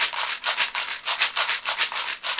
maracas 100 bpm, latin; Sound Effect Library

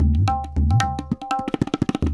tabla funk 110

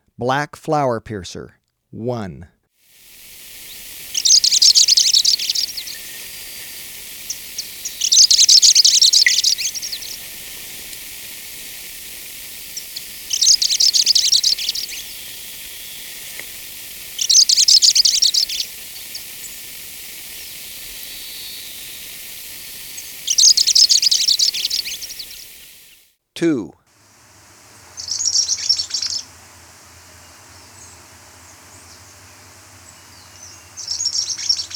Black Flowerpiercer, Birds of Ecuador; S. America

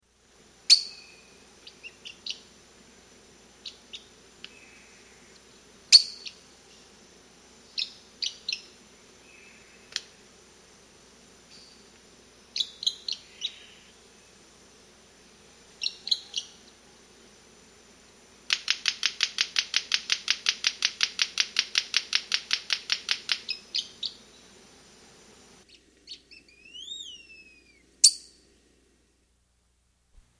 Red-Capped Manakin, S. America

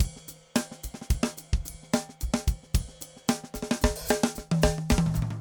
funk 110; odd loops

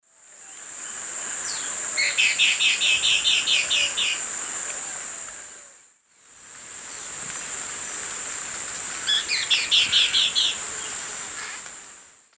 Cnemotriccus fuscatus, call; S. America; Eastern Lowlands

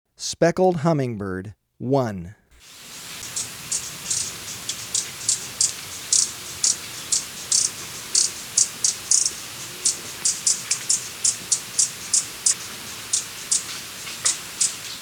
Speckled Hummingbird; S. America

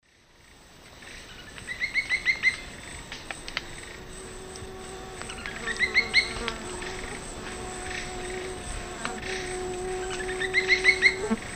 Dendrocincla merula, calls or song; S. America; Eastern Lowlands

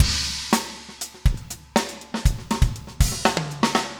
funk, 120bpm, loops

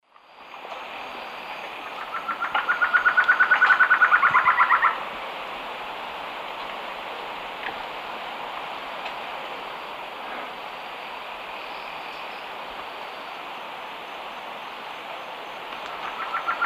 Thamnophilus amazonicus, song; S. America; Eastern Lowlands

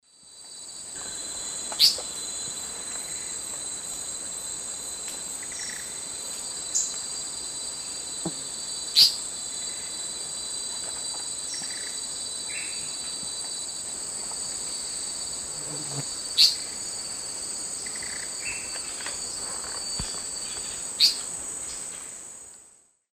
Ancistrops strigilatus, calls; S. America; Eastern Lowlands

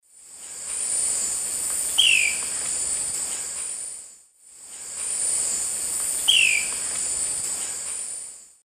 Lophotriccus vitiosus, calls; S. America; Eastern Lowlands

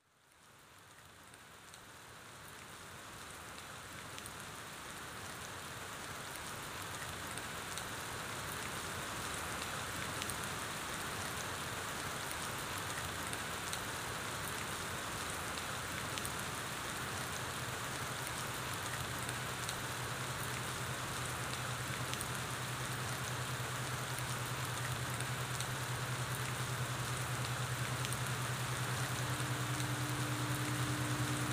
RAIN; The Dive 1; Natures Songs

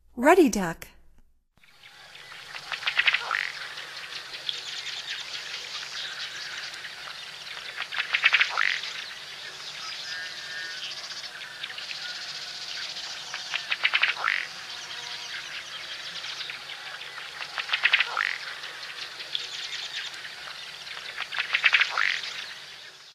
Ruddy Duck; North America; Stokes

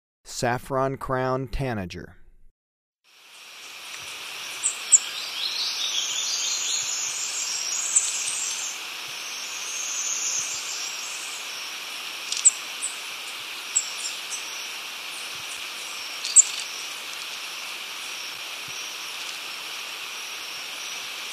Saffron-crowned Tanager; S. America

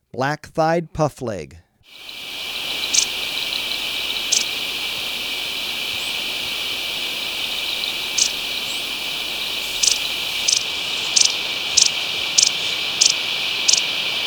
Black-Thighed Puffleg; S. America

